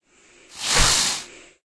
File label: snake attack